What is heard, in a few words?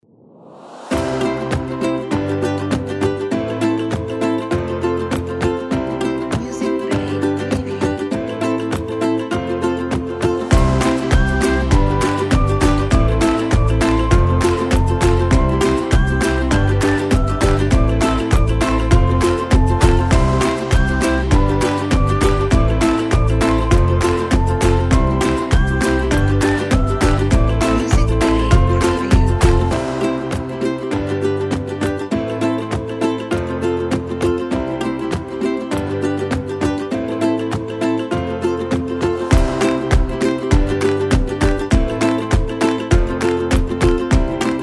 Happy Acoustic Ukulele Kids